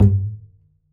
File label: perc, pizz; meatbass